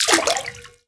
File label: fs fm water, footsteps; player; Portal 2